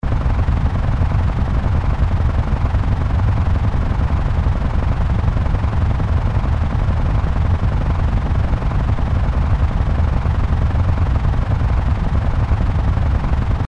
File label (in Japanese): 戦車が走る音 長め; 武器効果音